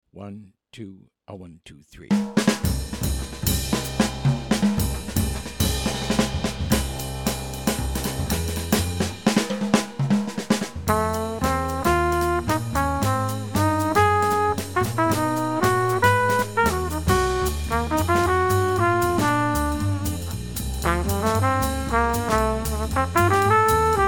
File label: minus piano